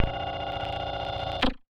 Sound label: Casio, Glitched, malfunctioning synth